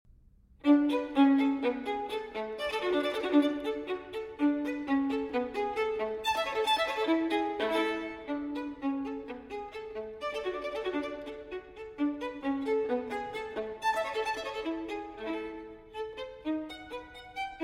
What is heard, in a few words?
Solo Violin in E-Flat Major Presto; TWV 4020; Telemann Fantasia